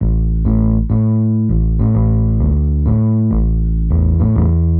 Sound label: X Bass, Rock Bass